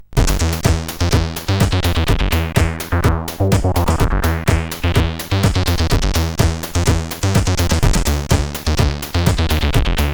Fake Rave, CSG8580R5

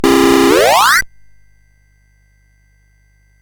Dragonworld sid CSG8580R5

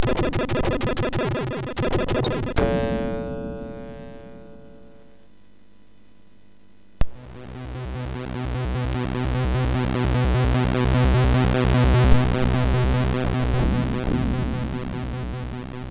An SFX Song sid MOS6581R4

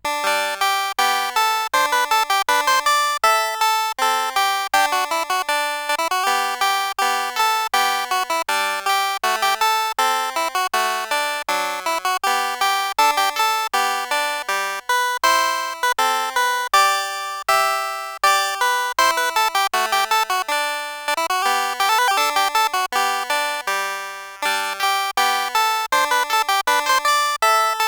Harp Tune, CSG8580R5

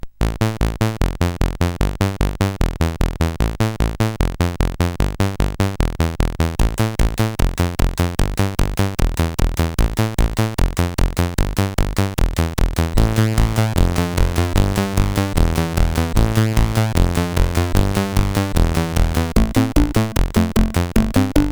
Small Fast Sid Music, CSG8580R5

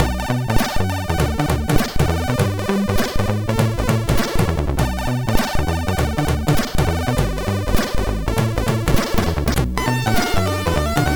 Europop, sid MOS6581R4; hvsc

